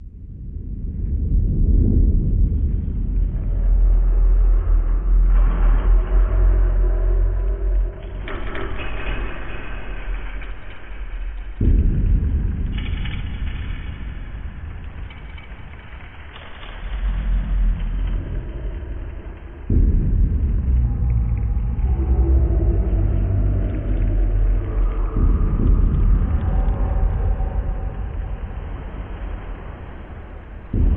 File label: The Beast Within Ambience